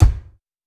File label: Rock kick; Reference Beat